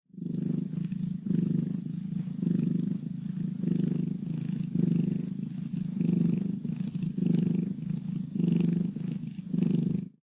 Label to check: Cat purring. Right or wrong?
Right